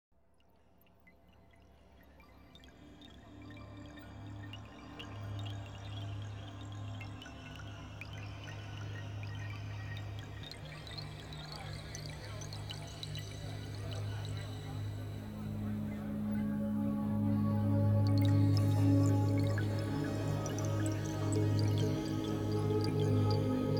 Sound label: inter-planetary ambience